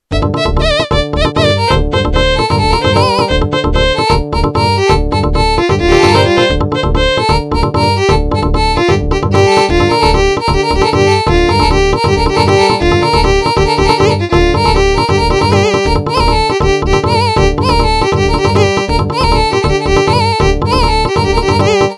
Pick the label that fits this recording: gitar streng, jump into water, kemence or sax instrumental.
kemence